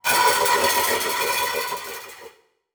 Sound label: Transition Sweep Warble; Production Element